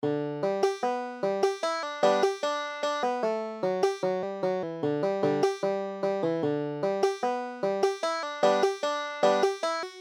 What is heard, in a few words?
banjo